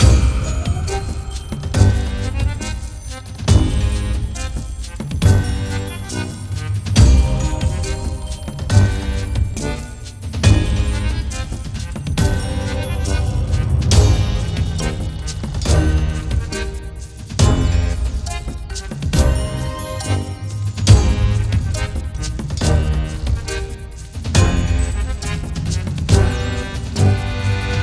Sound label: Idle LOOP; RatWorld